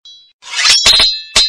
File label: sword; sarkar